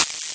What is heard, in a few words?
electric